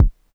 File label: polyvox bassdrum, Drum Machines, Formanta Radio Plant Polivoks